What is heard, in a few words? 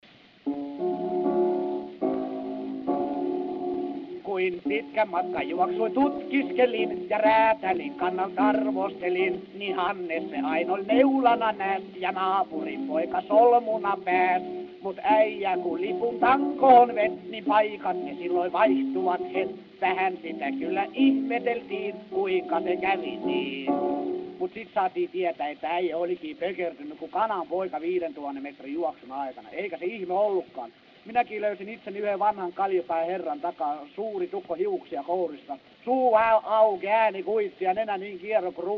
Gramophone